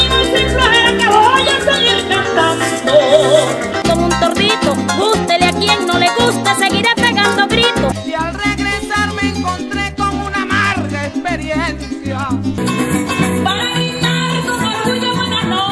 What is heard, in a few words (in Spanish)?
gruñido